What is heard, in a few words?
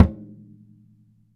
SURDO L MUTE, BRAZIL